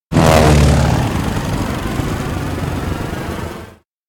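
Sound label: electronic plane takeoff; anemoi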